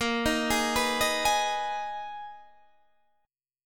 Ab Minor Bb, chord; Abm/Bb; arpeggiated

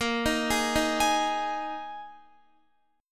Bb 7th Suspended 4th Bb Guitar 4E,4A,5D,5G,5C,6F

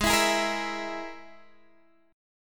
Ab Suspended 2nd Sharp 5th, Guitar, Standard; strummed; x,11,14,13,11,12; JGuitar

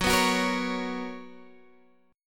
B Major 7th Suspended 2nd, chord

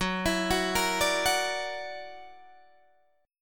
F sharp Augmented 7th F sharp Guitar Open D

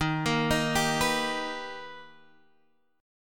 B Augmented Major 7th Eb, chord

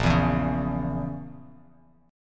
A sharp Diminished Bass Standard, chord; strummed

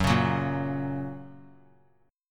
Gb Major; Gb strummed; JGuitar

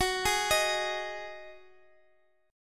Eb Minor Double Flat 5th

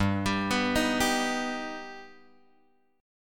G Augmented; G+ Chord {3 X 5 4 4 3} arpeggiated

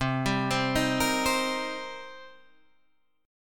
D sharp 6th, C

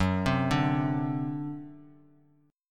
C Suspended 2nd Flat 5th F sharp

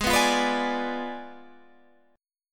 G sharp Diminished 7th G sharp, Guitar Standard; G#dim7 strummed; JGuitar

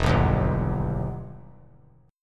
E Minor Major 7th E down; EmM7 strummed

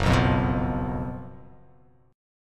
Gb Major 7th Suspended 4th Gb, guitar; GbM7sus4; strummed; 2,2,3,4,5,x,x,x; JGuitar

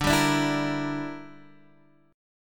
A sharp 6th Flat 5th

